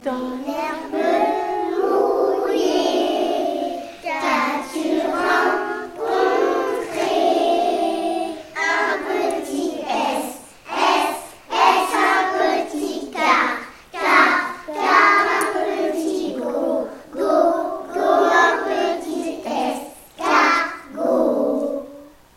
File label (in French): petit escargot